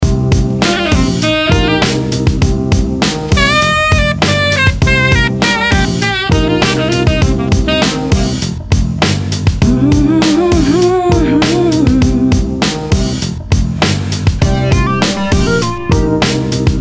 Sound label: Nu Soul Saxy Feel